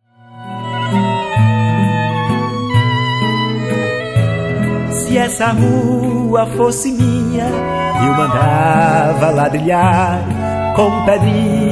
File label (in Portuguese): Vassourinhas Hino dos Frevos